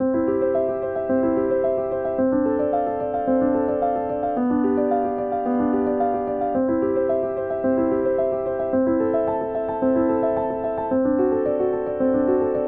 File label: piano soft; motu; machfive2